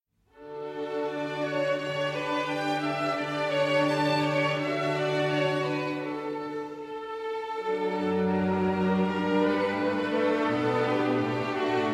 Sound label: Harp Concerto in A Major II. Larghetto